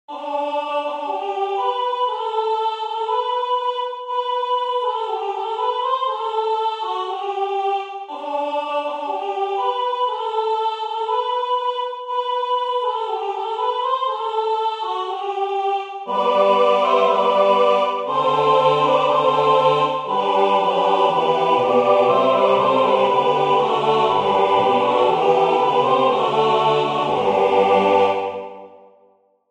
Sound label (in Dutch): DOOR DE LIEFDE LAAT U WEKKEN 4 stemmig